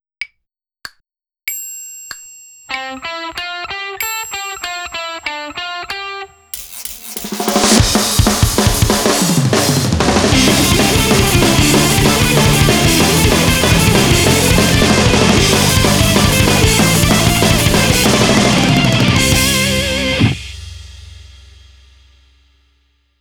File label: Guitar Practice